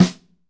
SNR, Roland; Northstar; Drumscapes Roland; Pop Country; Dry 1

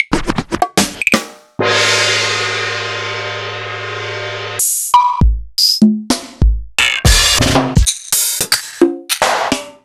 EMU Proteus-2000; m8

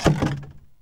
BOX RATTL; Ambient Sounds; Box Noises; E-MU Producer Series Vol. 3; Hollywood Sound Effects

